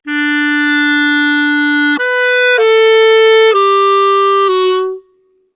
95 clarinet solo, Orchestral arrangements, 95 D maj